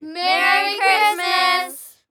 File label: voice crowd